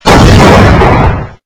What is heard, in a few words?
small explosion